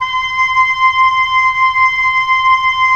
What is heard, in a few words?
WND OB P, Oboe p; Roland - LA Composer vol. 4